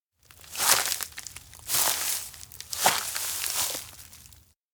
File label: steps outdoor wheat field walk arrive; anemoi